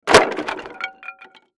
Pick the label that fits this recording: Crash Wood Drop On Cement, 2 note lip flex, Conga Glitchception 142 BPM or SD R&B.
Crash Wood Drop On Cement